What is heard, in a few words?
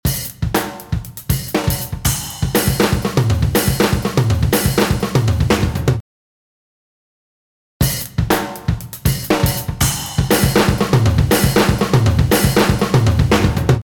drums